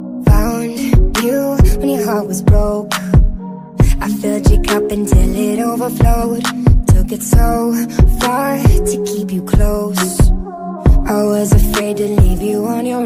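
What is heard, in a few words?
english flute ringtone; english ringtones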